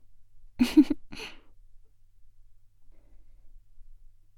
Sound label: laugh, ASMR; Garden; WithRin-Nazo01